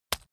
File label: leather impact mud hard